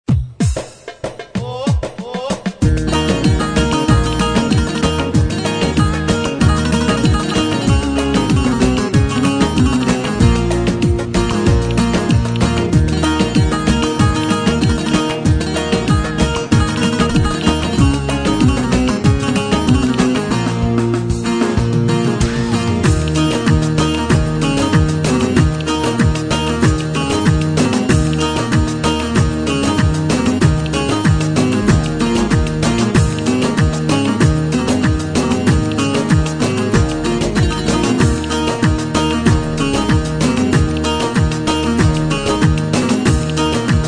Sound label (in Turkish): Korg PA1X; turk demolari